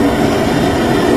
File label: robo scrape loop; source engine; tf; mvm; physics; video games